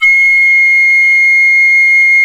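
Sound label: MOECK sopranino C#5; SoundBytes - Early Patches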